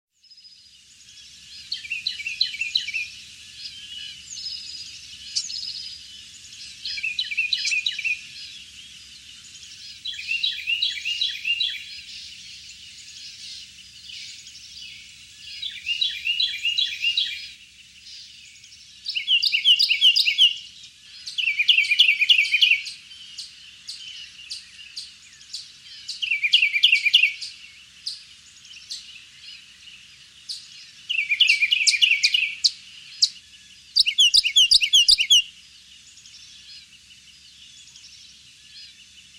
Carolina wren bird call

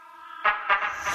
rain

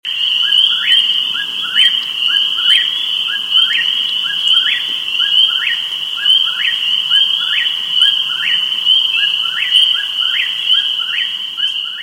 Whip poor will bird

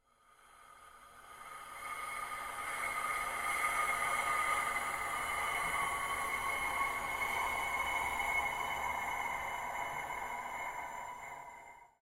Intro Wind

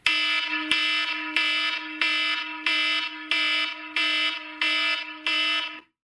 IPhone 12 Alarm IOS 14

